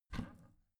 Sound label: bathroom cabinet both open soft